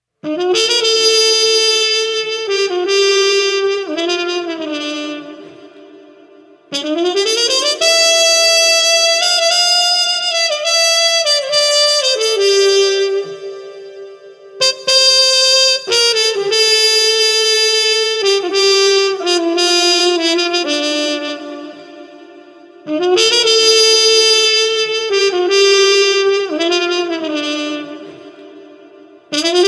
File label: Street Trumpet Muted Majestic 120